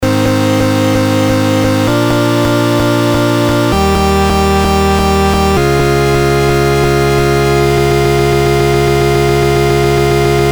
SP12 CHORDS Original

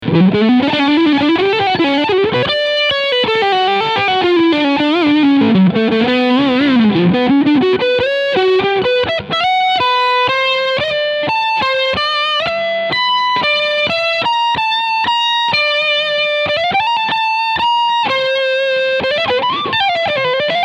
FGN Boundary Odyssey Overdrive Middle Singlecoil

